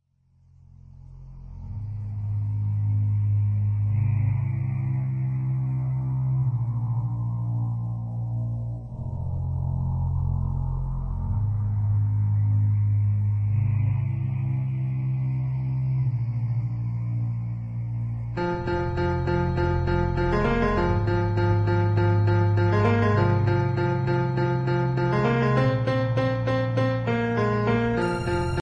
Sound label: blackberry torch piano song ringtone; wheelmanv